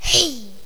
genie attack; megaglest

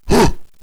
warrior attack; megaglest

